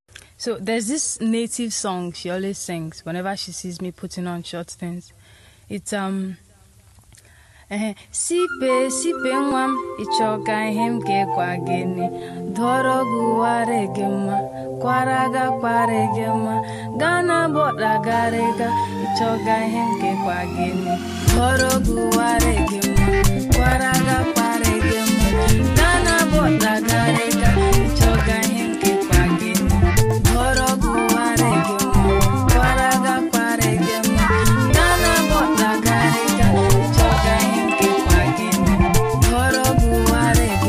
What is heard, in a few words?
Igbo Highlife Egwu Akwa